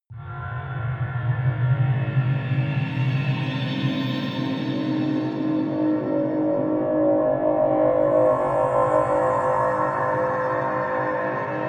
alien ship hull, atmosphaeren

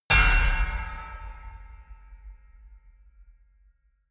metal horror clang, metall

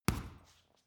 boxing punching bag side hook hard; sport freizeit; boxen

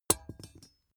small metal drop clang hard; industrie wirtschaft; metall

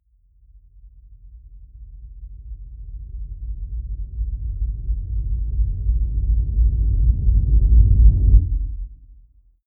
deep noise synth rumble sub, Risers; whooshes rises

